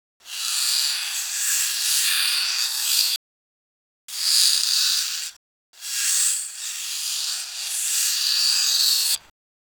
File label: intense friction of a thi, rope